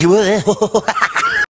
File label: blooper laugh; doc oc